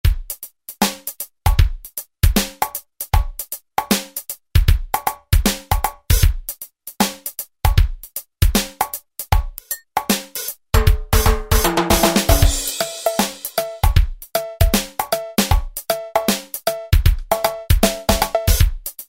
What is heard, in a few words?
Ragga Dub, Alesis SR-16